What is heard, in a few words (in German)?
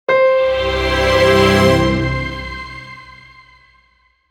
Soundlogo Intro; Theaterpreis HH